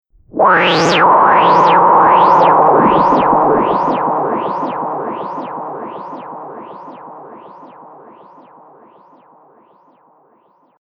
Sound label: Chaotic Electronic Wind, whoosh; Andrew Fleming